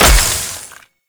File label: Void Fully Charged; Duviri; Incarnon Adapters; Weapons